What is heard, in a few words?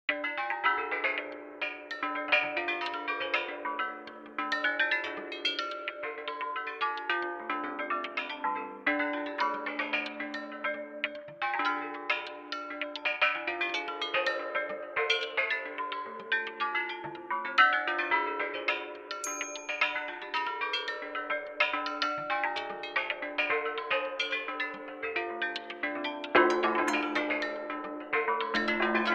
Metal Scales